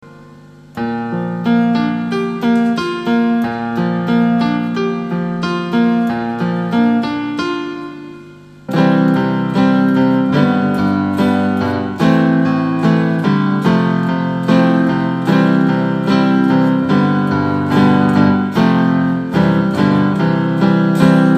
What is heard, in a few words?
piano